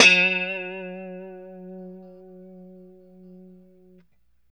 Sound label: POP F#3, STRAT guitar; Spectrasonics; Hans Zimmer Guitars VOL-1; JC-STRAT POP